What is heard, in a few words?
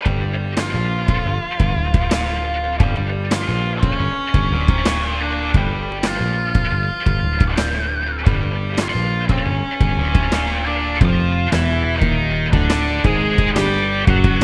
music; ABC Sports Indy Racing